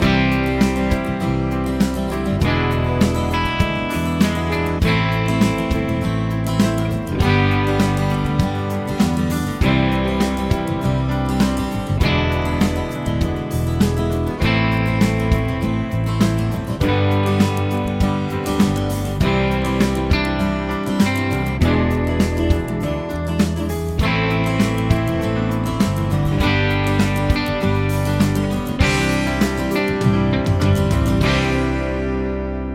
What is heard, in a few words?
progs with applied chords